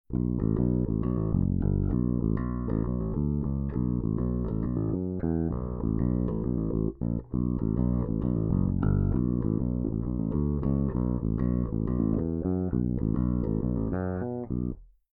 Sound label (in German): passiv Seriell Bridge Pickup